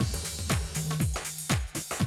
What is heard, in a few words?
Classic Drum Loops